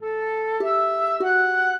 minuet, flute harp

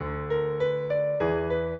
minuet, piano; Mozart

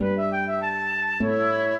minuet, flute harp; Mozart

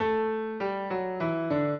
piano; Mozart

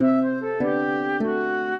trio, flute harp; Mozart

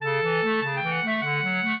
clarinet; Mozart

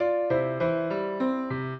trio, piano; Mozart

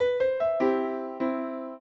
piano; Mozart

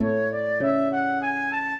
minuet, flute harp; Mozart

